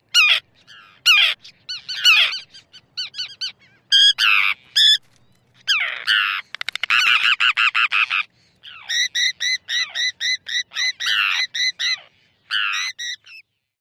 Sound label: calls at nest